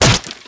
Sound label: SW BODY; thief